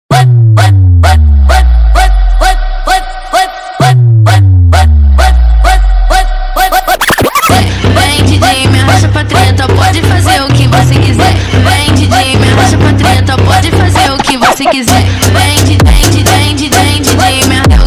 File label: brazilian phonk